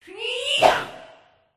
boss elf attack voice bottom

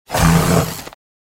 Tiger Block

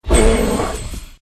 Bear Block

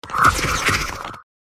Scolopendra

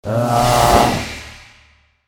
attack

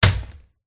bot attack contact bottom

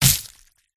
worm attack contact

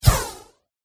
mage male attack contact up

